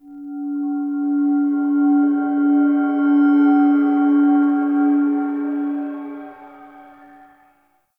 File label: Temple Mid Bells Swell, Corpus Ship ambience; Temple One